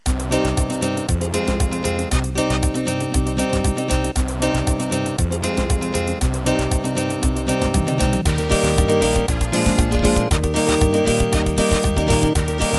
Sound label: Gypsy